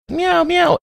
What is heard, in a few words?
meow meow